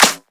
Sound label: Clap Single Hit C# Key, Royality free hand clap sample tuned to the C# note. Loudest frequency: 4681H; single hits one shots